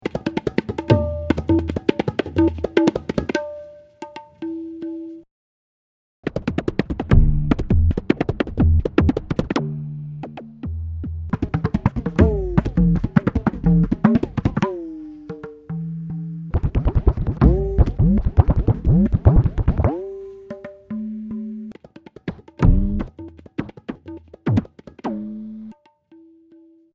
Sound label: tabla